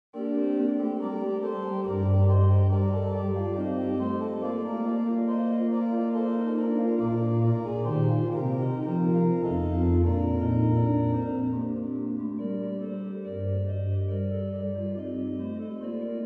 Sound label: Noel, Organ Music, Souvigny; Boely